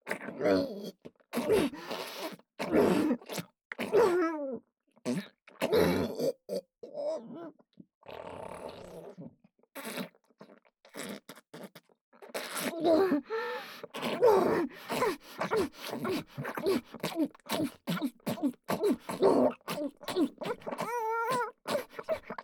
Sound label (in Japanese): ツンデレ 喉奥フェラ, ASMR